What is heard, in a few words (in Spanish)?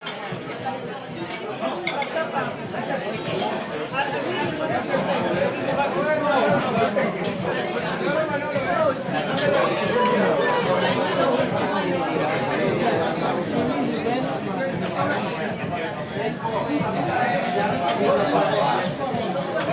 restaurante; espana; donosti; casa vergara